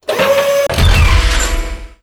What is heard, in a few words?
Crane Machinery Atk; FlyForInsanity